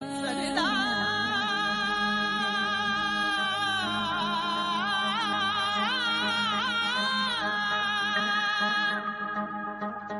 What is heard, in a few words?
flute